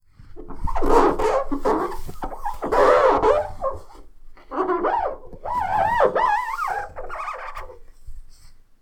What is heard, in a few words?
Window Wipe